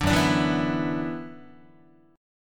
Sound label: F sharp 7th Suspended 4th Sharp 5th, Guitar